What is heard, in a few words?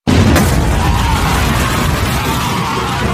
Summon Sound